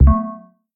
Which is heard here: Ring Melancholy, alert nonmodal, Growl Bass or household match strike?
alert nonmodal